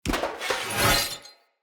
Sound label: Sword Heavy Attack Charge; Weapons; Enemy; Swords; Mnd King Swords